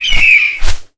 damage

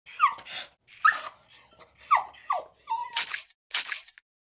Dog Idle

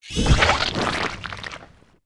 Vampire Bat Damage

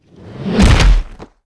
Were Bear die

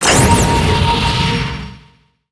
Holy Smite

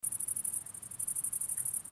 Night Bugs

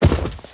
Ice Bear run

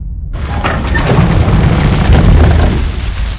door open

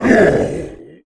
Weakened Orge die

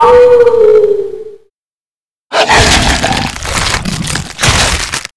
Snowy Wolf Skill

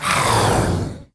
Caiman die; Seer; GamigoNA

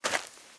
Leopard Back; Seer; GamigoNA

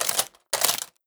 spearman walk

